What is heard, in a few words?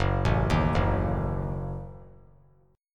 F sharp Minor 6th F sharp; arpeggiated; JGuitar